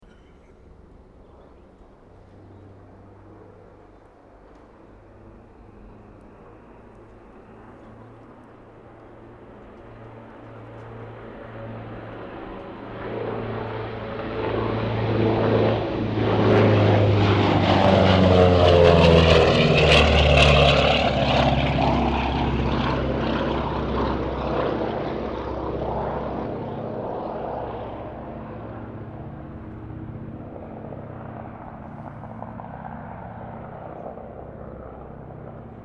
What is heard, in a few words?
lockheed hudson pass, aircraft; 1941